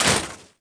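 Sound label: Metal Collapse Small; objects; Star Wars Obi-Wan; XBOX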